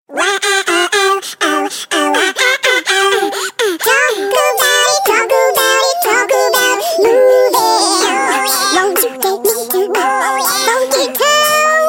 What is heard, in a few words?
Singing Chipmunks